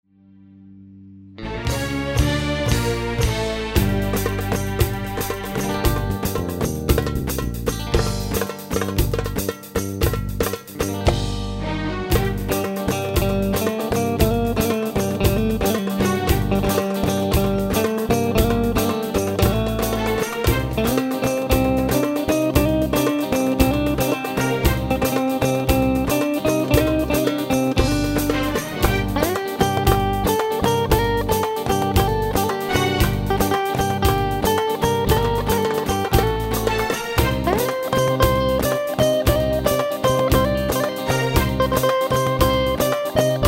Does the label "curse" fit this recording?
No